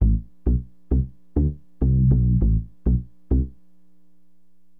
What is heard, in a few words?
SYN BAS, Acid Jazz Funk; Bass